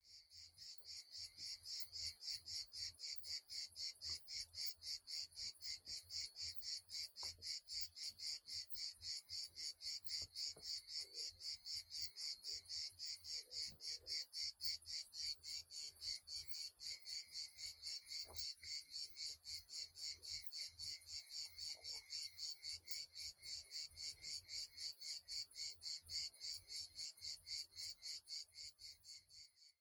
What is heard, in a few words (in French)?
Chant Cigale grise, Béarn; la cigale grise dans le bearn